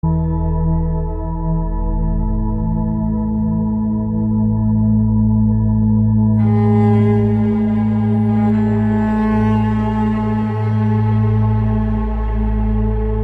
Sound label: Sacred Tones